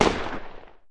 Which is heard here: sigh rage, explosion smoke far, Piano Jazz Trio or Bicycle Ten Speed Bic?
explosion smoke far